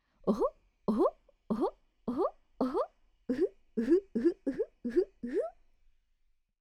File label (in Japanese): uragoe